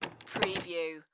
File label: Key Remove From Door; Sound Effects Bible